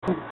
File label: "Hmm"